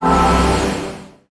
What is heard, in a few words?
attack heal